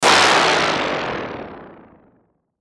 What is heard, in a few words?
Descend Wind Down Static Hit; Descending Sound Effects; Blastwave